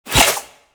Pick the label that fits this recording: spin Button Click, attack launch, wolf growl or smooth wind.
attack launch